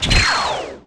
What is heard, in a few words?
Falcon Plasma; Demigod; Playable Tutorial Level